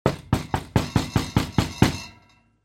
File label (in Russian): drebezzhat polki; Звук стука в дверь